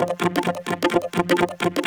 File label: Vocoder Loops 128BPM